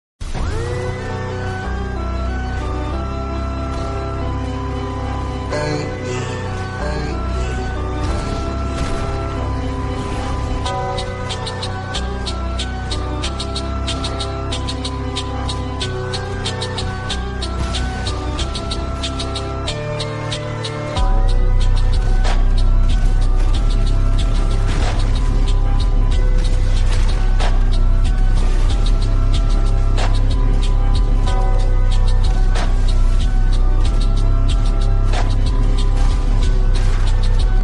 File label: phonk